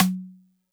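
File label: Xylo Snare DX100 F sharp 4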